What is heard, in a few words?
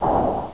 rocket, weapons; xash3d fwgs; halfsecret